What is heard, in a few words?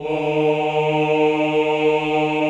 CHO, Men-Combi; Sound & Vision - Gigapack I CD 2 (Roland)